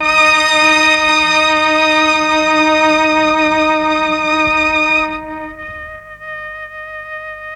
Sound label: MEL STR, Legendary M400